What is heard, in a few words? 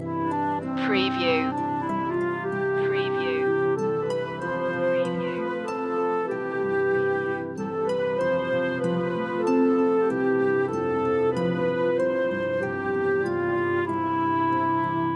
orchestral loop; the first noel; media music now